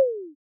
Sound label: ele Plink; Mistergenerator